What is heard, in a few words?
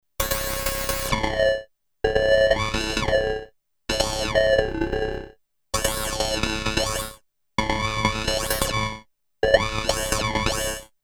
synth lofi, Polivoks; Polivoks formanta